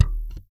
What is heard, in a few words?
VALLEY FUNK, Bass; Spectrasonics; Bass Legends